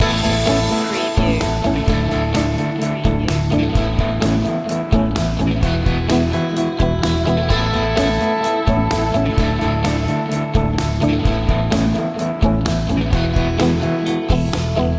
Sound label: sidewalk rock loop